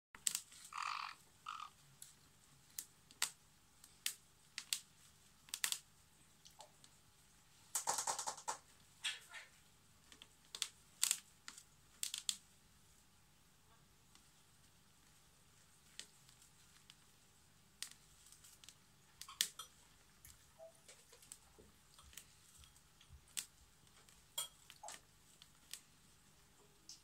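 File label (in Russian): zvuk popugaya, parrot sound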